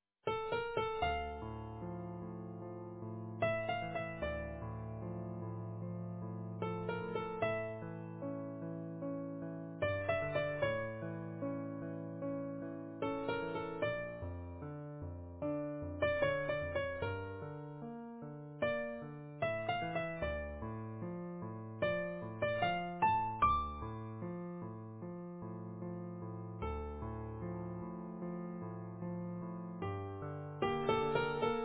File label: easy piano